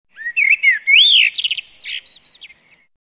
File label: birds